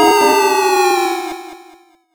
Retro Descending Long

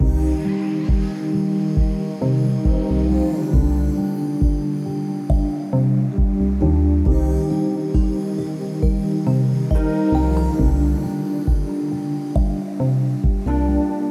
Sad Boi Loop

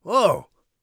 surprised, Warrior Voice; Warrior Voice Pack

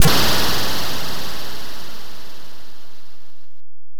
Noise Digital Fade; FREE SFX PACK VOL 3

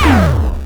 Retro Negative Short

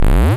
square zip; Square Waveform sfx

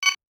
terminal, sci-fi; sci-fi sfx

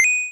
pickup, 8-Bit Sounds

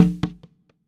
Bongo item pick; FREE SFX PACK VOL 2

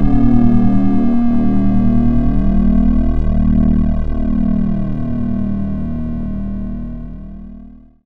Retro Cinematic Long

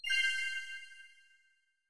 confirm, tones; inteface sfx pack 1

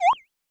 square miss; Square Waveform sfx

